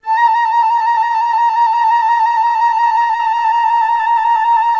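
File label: flute A4; Best Service; Peter Siedlaczek's Advanced Orchestra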